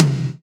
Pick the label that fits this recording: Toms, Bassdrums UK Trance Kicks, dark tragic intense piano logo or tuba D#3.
Toms